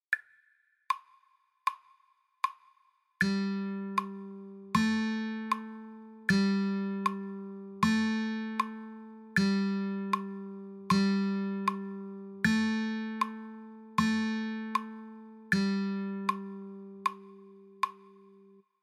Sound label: 3rd String G, guitar